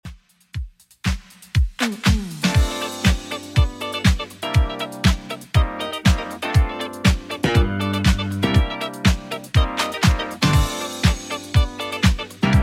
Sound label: 80s Redrum 120 bpm